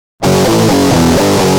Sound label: G Jam, Guitar; Industrial